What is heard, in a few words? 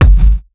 BD, korgdrums; Korg Electribe; ESX-1; electribrary-3